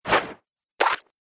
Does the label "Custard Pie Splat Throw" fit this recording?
Yes